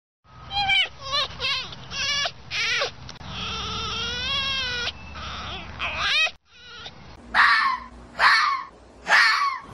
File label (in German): fchsin; haus und nutztiere erkennen